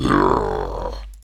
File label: Death, human male evil; customnpcs